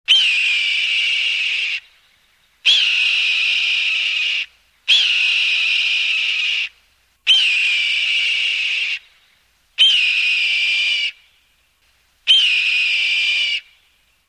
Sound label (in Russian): zvuk jastreba